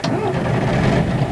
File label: Drill Engine Start; Playable Tutorial Level; TR1